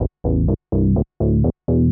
SNTH BASS HOUSE 125 A; eJay

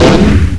cannon; Nuclear Hammer

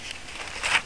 Pageflip

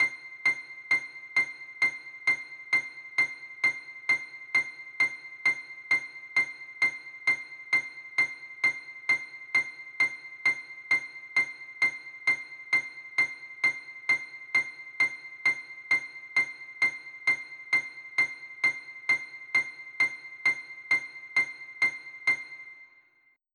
Tick; mt32

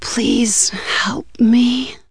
VX DIE; REDNECK; RAMPAGER

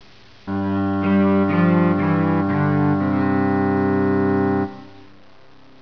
cello

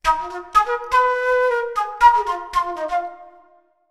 Flute Auditorium

